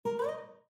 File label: Heritage Pop-up Blocked, windows 7